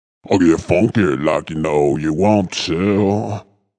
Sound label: Vocal Deep Get Funky Like U Know U Want To 110